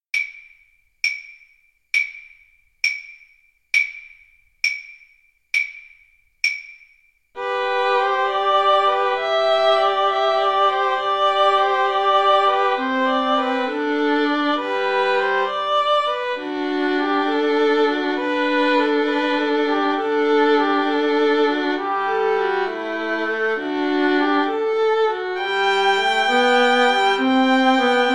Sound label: pastorale VLNS